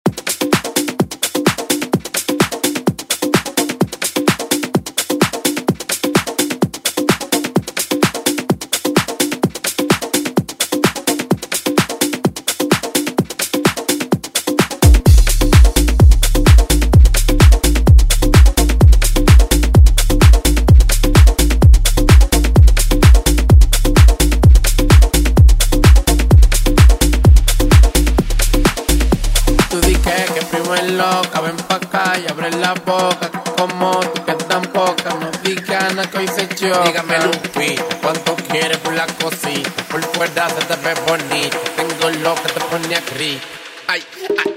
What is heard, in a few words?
Tech House; Deejay Hit